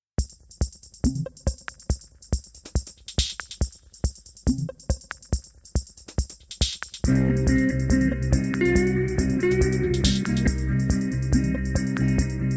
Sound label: rockpop, noise